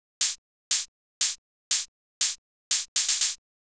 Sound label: juno6 chh; hydrogen